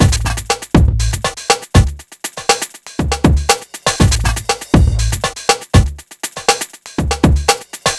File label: Beat, Drums